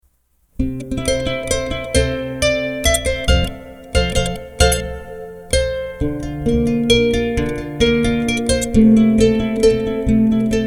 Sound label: a little ballet kora music